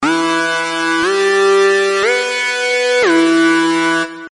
Lead Sample

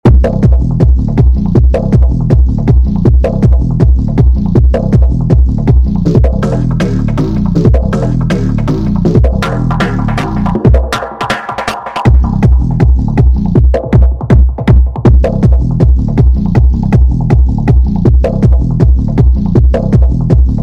Bass

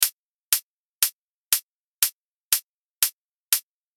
hat

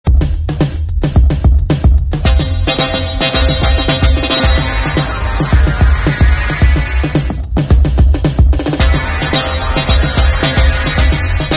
Arp bass Solo gytar

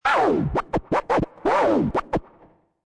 scratch

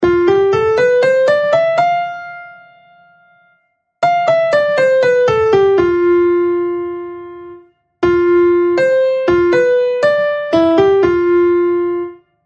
lydian major

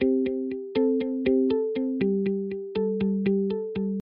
Mute Guitar clean; Гитара чистая; Компрессия. Основы компрессии звука

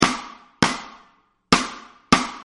rhythm

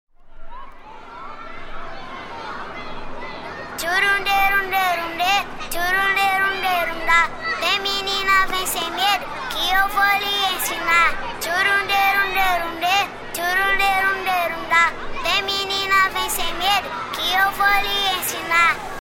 electro

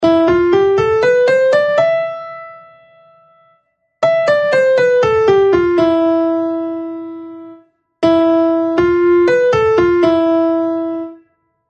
frygian minor

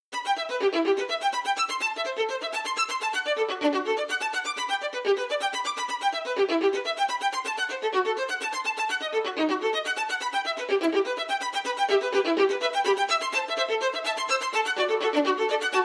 Arpeggios

